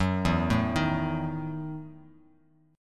E Major 7th Suspended 2nd Suspended 4th down Bass Standard, chord; EM7sus2sus4 arpeggiated